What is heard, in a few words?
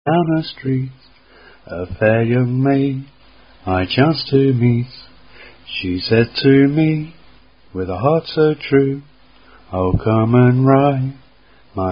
Rim Ram Roo Sings